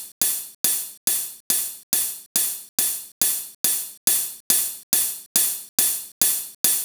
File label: 140 House Open Hi Hat; Dance DJ; Drums; Hats; Pro Tools Essential Loops; Digidesign